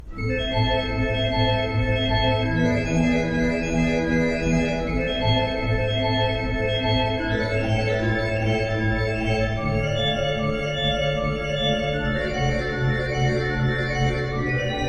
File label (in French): concerto pour 4 claviers, vers orgue, adagio; BACH; Bwv 1065